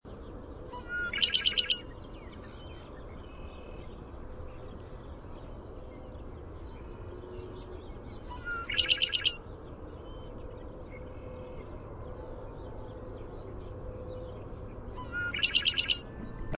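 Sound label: Red-winged Blackbird song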